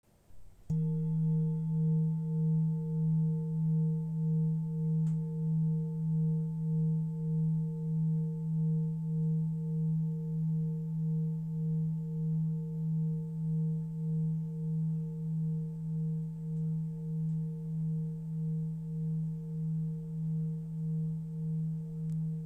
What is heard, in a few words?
Shank